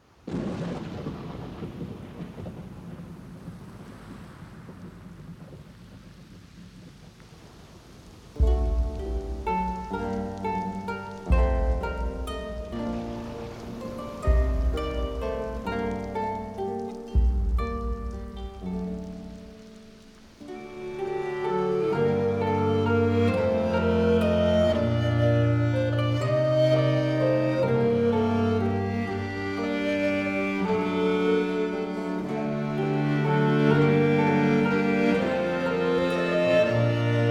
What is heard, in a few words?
Pop Preludium